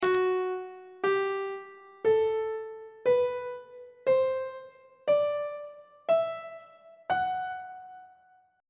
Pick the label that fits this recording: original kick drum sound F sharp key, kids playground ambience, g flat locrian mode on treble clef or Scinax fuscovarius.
g flat locrian mode on treble clef